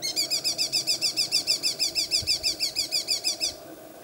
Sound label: verso maschio falco cuculo vespertinus red-footed falcon rotfussfalke cernicalo patirrojo; rapaci diurni